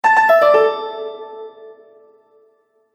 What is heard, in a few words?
Bright Melody Mobile App; Musical